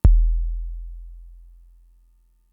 Kick, Drum Machines Fricke MFB-522

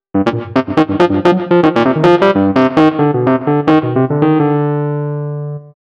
Rhodes Solo, Cinematic Funk

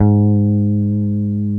PEDAL STEEL

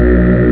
string; opel; ST-XX Amiga Soundtracker Sample Packs

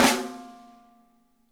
snare flam; Sonic Reality; Interactive Drum Kits; ID SNARE 2+

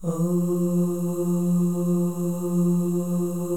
FEM OHS, Symphony of Voices; Spectrasonics

